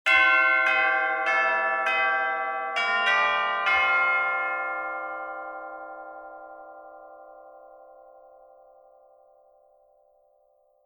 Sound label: Christmas Music; Tera